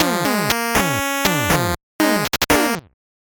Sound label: 8bit jingle normal